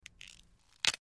Break Or Snap Vegetab; punches kicks hits bones

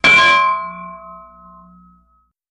Ding Metallic Hit, chimes & bells

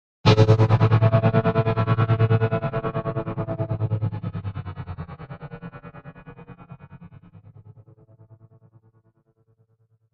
Chopper Heavy Heli

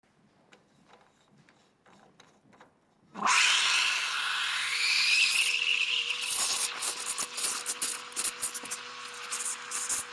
Cappuccino Machine, coffee machine

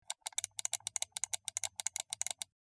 Highpitched Mouse, mouse

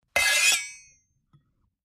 Spatula Long Scrape, kitchens

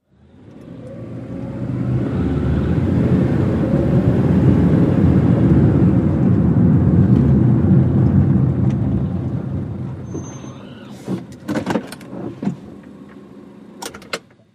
Sm Car Eng Accele, compact

